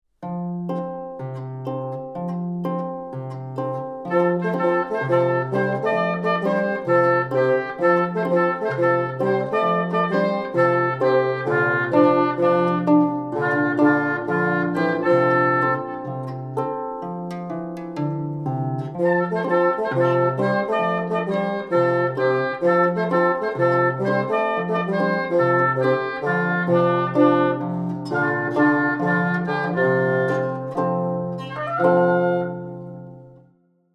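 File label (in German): Trio Oboe Fagott Harfe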